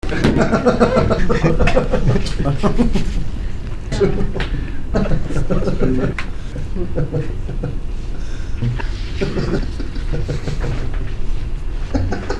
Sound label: laughs